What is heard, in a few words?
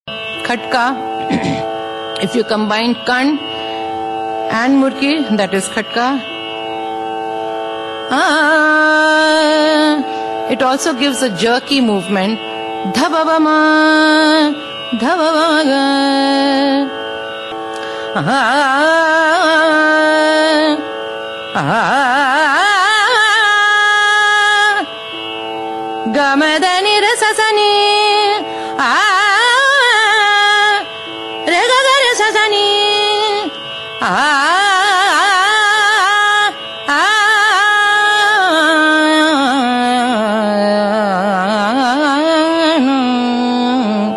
khatka